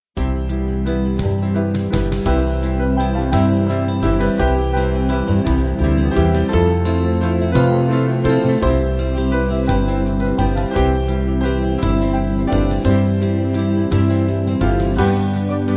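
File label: Band slow, Music Backing Files for Churches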